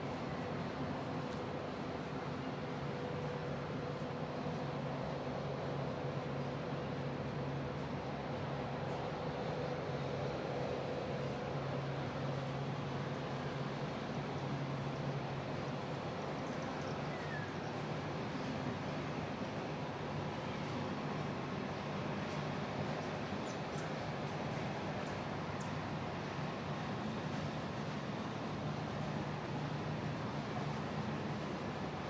refrigerator rail cars passing by

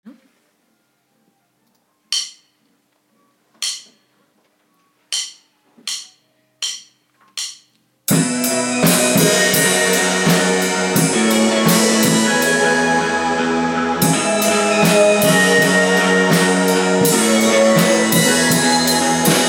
drum solo

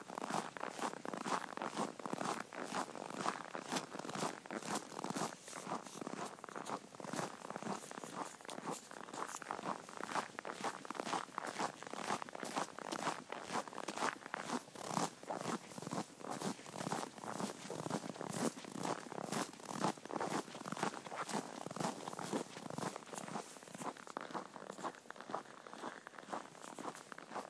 snow walking

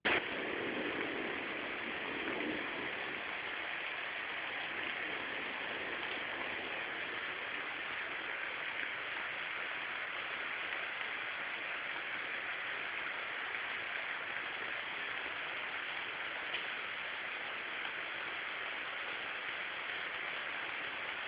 rain thunder

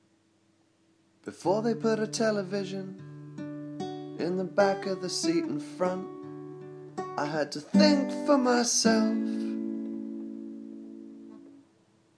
song television

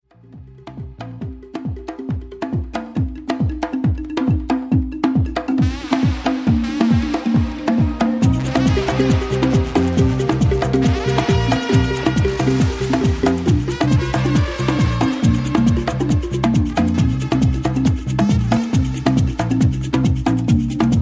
tribal drums

